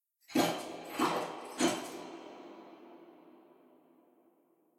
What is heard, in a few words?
walk handstand exit phase